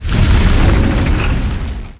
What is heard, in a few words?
monster action plants